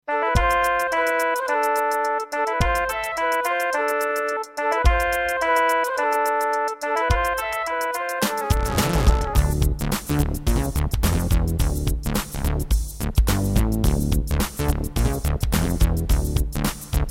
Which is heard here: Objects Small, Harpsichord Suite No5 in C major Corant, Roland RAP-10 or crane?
Roland RAP-10